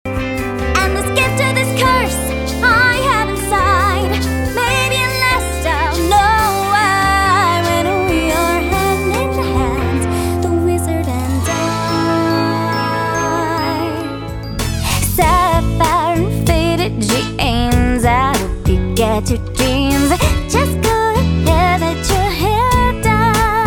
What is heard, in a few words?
Singing